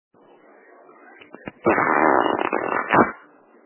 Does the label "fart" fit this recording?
Yes